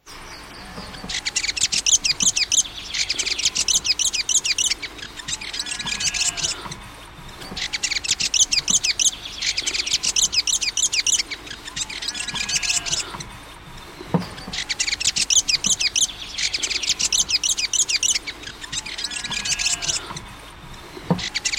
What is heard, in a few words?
fantail